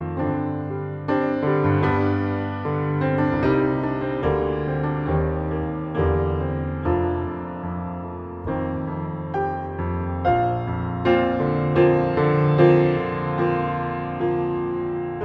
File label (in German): Ueberleitende Improvisation am Fluegel